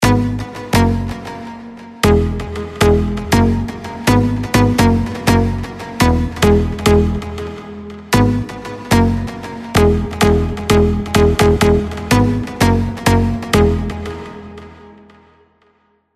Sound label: MELODICA